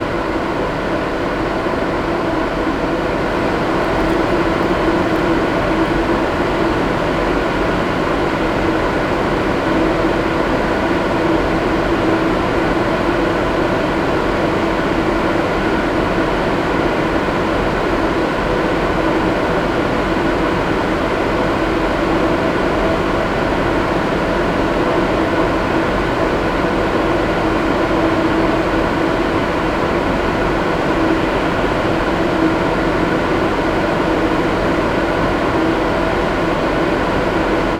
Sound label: chiller stereo, soundscape; La Silla ESO 3.6-metre-telescope chiller